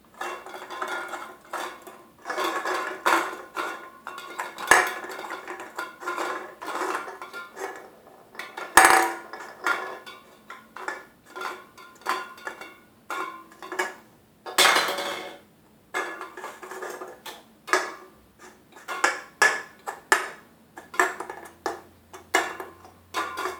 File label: Empty Soda Cans